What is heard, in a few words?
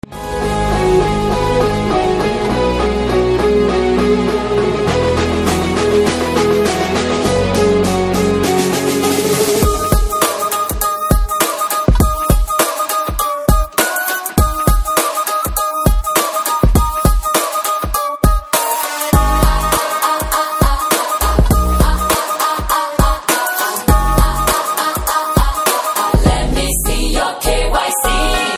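background music Ringtone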